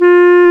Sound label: WND CLARI, Clarinets, Clar Bryt; Roland LCDP04 Orchestral Winds; 90 s Sample CDs